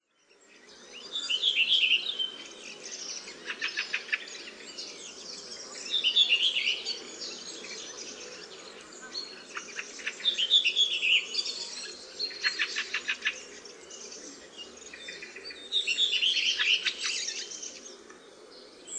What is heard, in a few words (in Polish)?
drozdzik; birdsounds